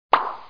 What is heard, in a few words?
pick